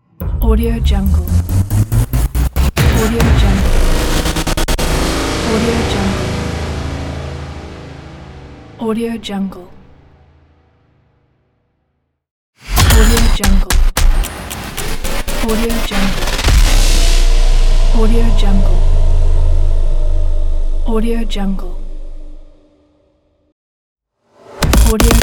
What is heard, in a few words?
collection of cyber transform riser; audio tree